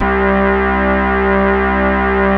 Roland JX3

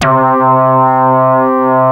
ODY, ARP Odyssey

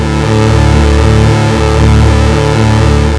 Siel DK-600

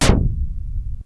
snare, Chroma Polaris; Rhodes

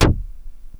snare, Chroma Polaris

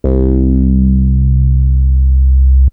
fat bass g2, Kawai SX synths

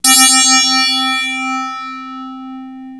RAZOR BASS C5, Yamaha TX-81z

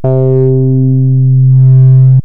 fat bass c4, Kawai SX synths

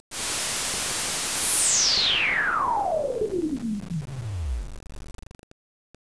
NOISE, Siel DK-600

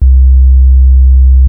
sub bass c2, Chroma Polaris; Fender Chroma-Polaris

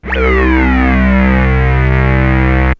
syncsweep, Chroma Polaris

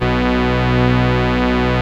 Casio CZ

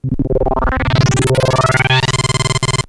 pwm sync sweep, Chroma Polaris; Fender Chroma-Polaris

